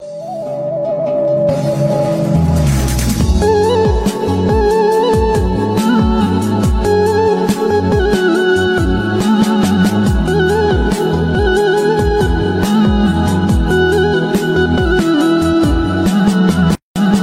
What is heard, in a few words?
Ringtone